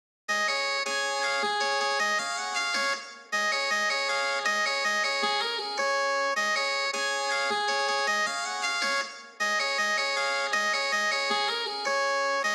breath high guitar

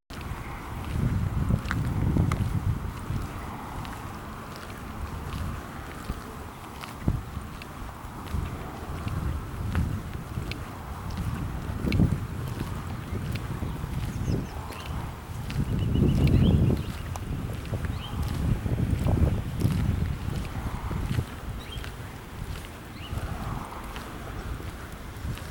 ambience

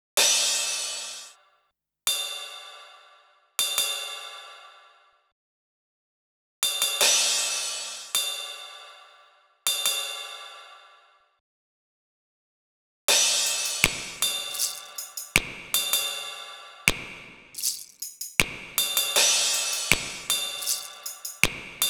breath extra percussion

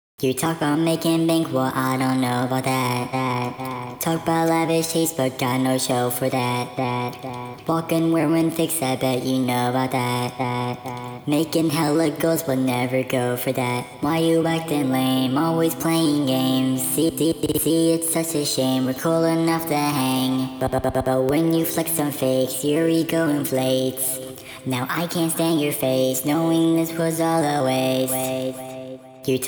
vocals

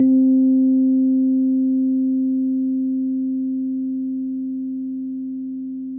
synth

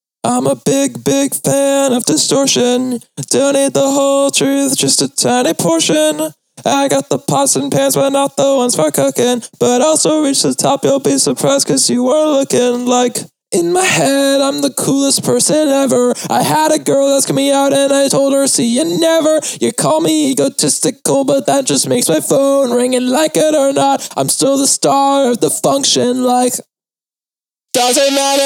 144bpm Vocals

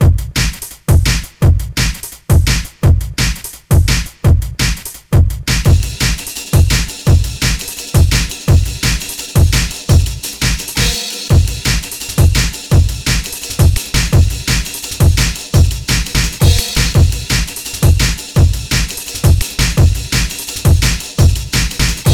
drums

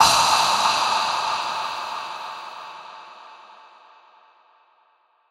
Breathing Long Exhale; KSHMR

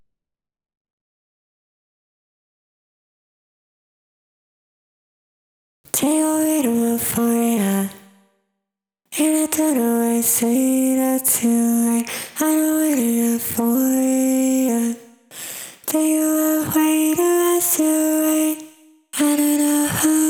vox

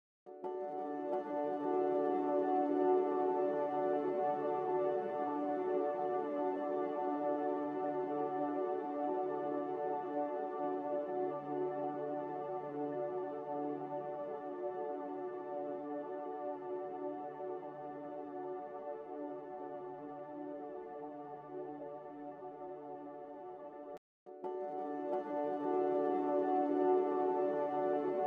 oct piano

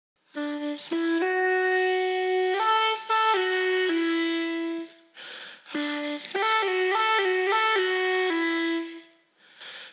vox

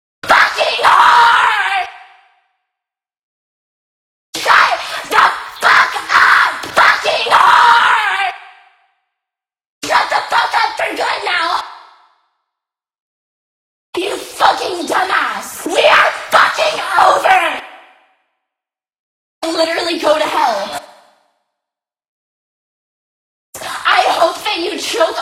vocals

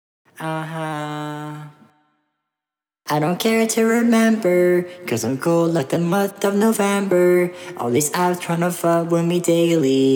vocals

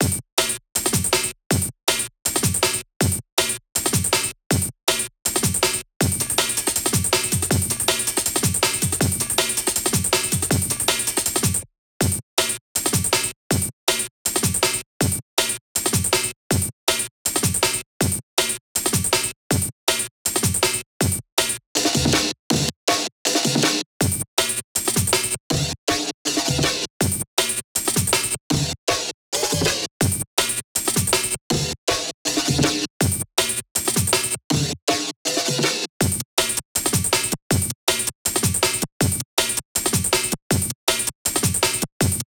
drums breaks

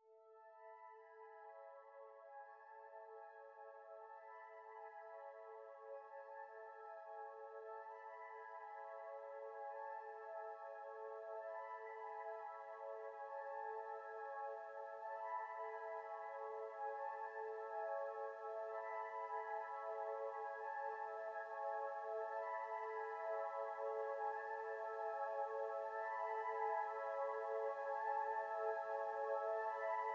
arp

